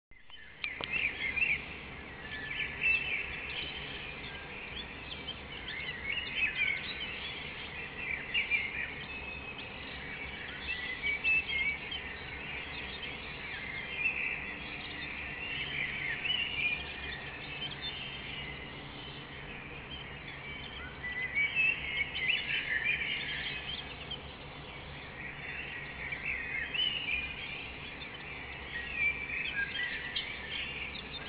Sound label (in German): vogelstimmen